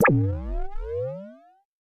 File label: laser; lasers